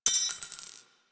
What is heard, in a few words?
Belt Fed Rifle Links; Weapons; Warframe; Tenno; Frumentarius